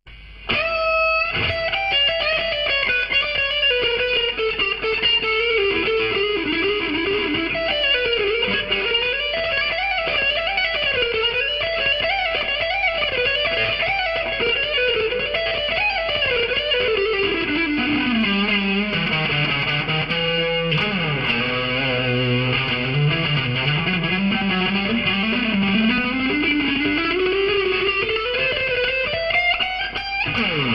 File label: rock